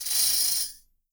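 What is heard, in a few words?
shake, left, Percussion; Miroslav Vitous - Percussion & Harp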